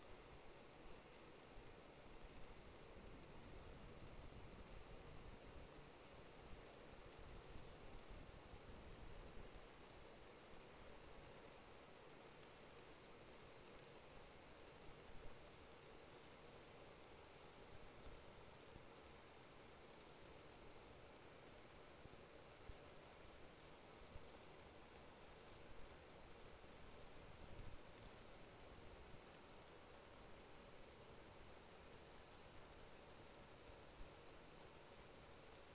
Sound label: HYDROPHONE 16000Hz; JASCO AMAR HYDROPHONE 740